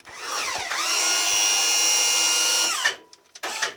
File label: Plane P40 Servo Wing Flap Up; Airplanes